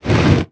wood slide; Tony Hawk's Project 8; XBOX; moveable pieces

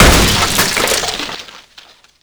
smash wood; hits; Tony Hawk's Project 8; XBOX

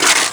bail board; skater; Tony Hawk's Project 8; XBOX

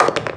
pocket; Virtual Pool Tournament Edition

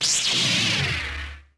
lightsaber on; weapons

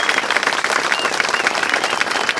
crowd

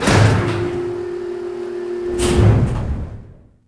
door ship turbine Hatch; doors elevators; Star Wars Obi-Wan; XBOX

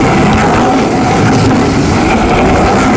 slide gutter; terrains; Tony Hawk's Project 8; XBOX

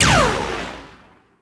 canon, weapons; sith

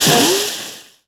Ball Tumbleweed, Impact; Cars; XBOX

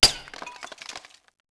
marble hit, bullet hit; projectiles; Max Payne

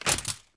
droid fall

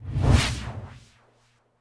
force pull

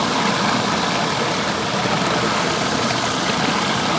kitchen; Star Wars Knights Of The Old Republic I; XBOX

